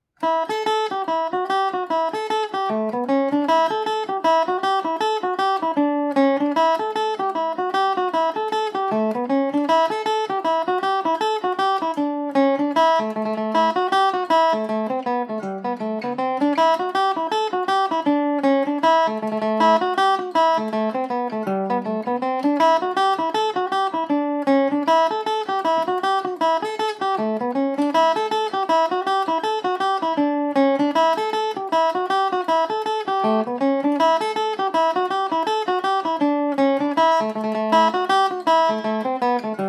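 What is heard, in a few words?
A mixolydian, Irish mandolin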